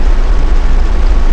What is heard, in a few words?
arrow; Mafie 1; NPC